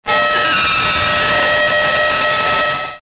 Feedback Distortion High Static; Feedback Sound Effects

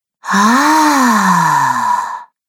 Woman Amazed Ah; Recorded Voice Sound Effects

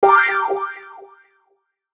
Hit Wah Delay; sound design; Blastwave

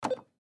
Printer Copy Machine Push Button Beep; Printer Hewlett Packard Copy Machine Push Button Beep; Office; Blastwave

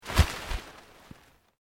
PLANT LEAVES LARGE MOVE FAST, rustling

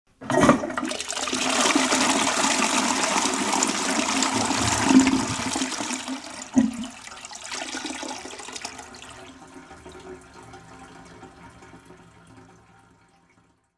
Toilet Flush, Flushing of toilet water.; Bathroom (Sound Effects:Household)

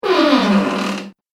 Door Creak; Creaky Door

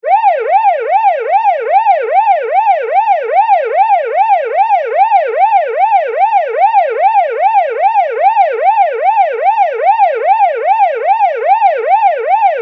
Police Siren Continuous; Siren Sound Effects; Sirens (Sound Effects:Electronics)

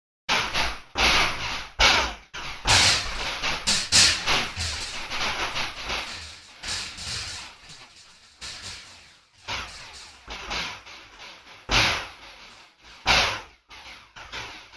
Alien Attack Ambience; Conflict Earth - Alien Attack Ambience; science fiction sound effect

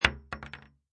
Bullet Shell Drop Pistol Hollow Wood; Bullet shell drop, Pistol: empty Pistol shell falls on hollow wood.; Impact

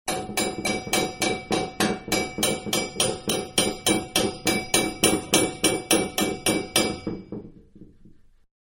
Hammer Medium-On Metal Pipe 2-Several; Hammers (Sound Effects:Tools); Manhattan Production Music

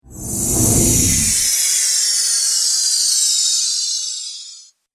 Future World Whoosh; Whoosh Sound Effects

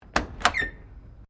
Door old truck 1920s open, Old broken truck doors; Miscellaneous Doors

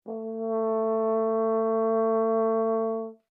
HORN A4 MP LONG CRES, French Horn in F; Alexander French Horn in F; Horns; Musical

